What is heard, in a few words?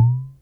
SYNCUSSION